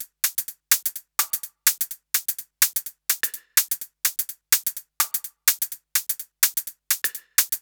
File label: 126BPM Drums; Vengeance Samples; Klondike Kit